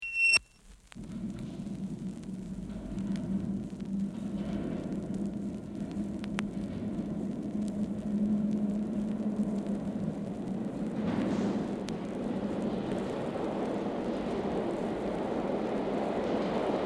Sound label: Train arrives and departs (in tun